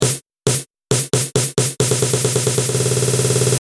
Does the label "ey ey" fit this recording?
No